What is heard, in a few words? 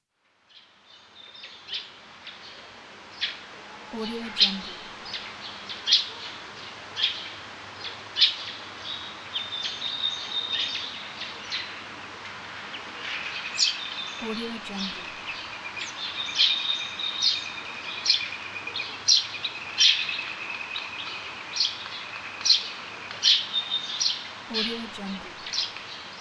birds in forest atmosphere